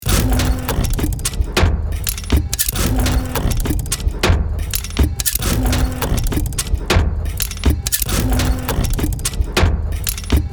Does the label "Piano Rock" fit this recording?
No